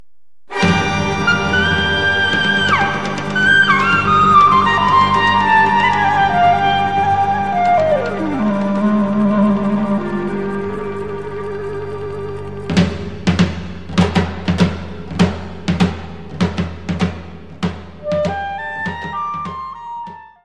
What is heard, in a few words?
Cocek; Serbie